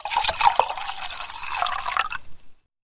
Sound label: Pour Water In Glass